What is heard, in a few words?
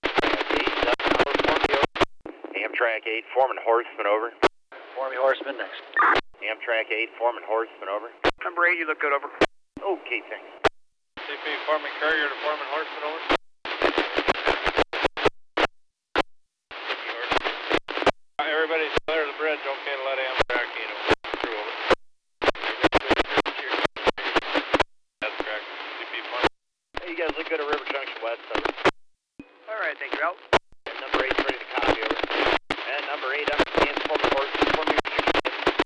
Amtrak